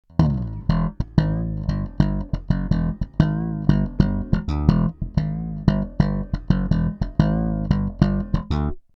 normal slap

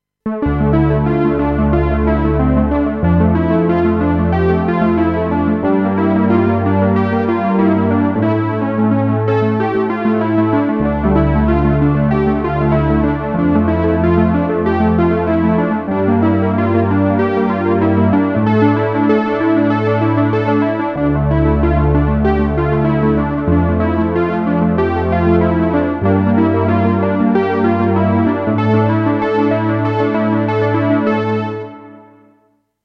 Ensemble

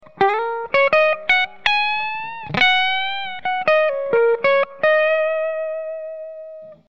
Clean Lead

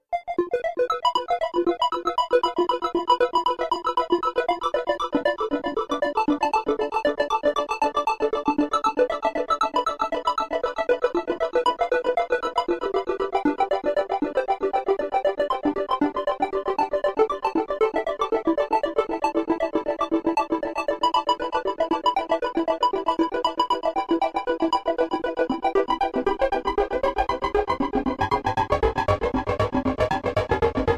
UDO SUPER6

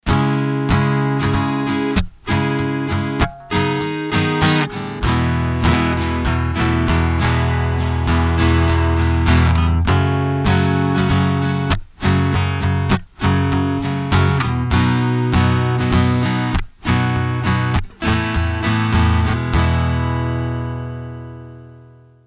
Strumming Piezo

